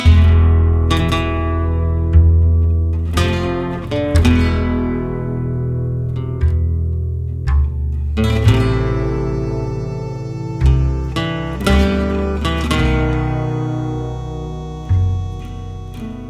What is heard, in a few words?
Lose; Tapas del Mar; Cooking Dash